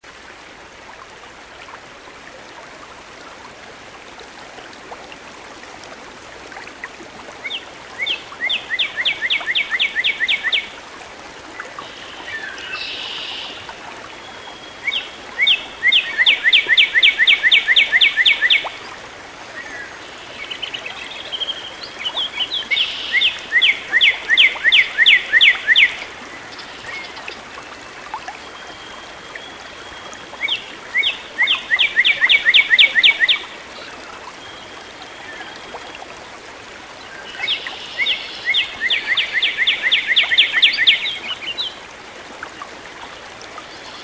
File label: song bird, Birdsong